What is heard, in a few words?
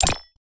ui sword select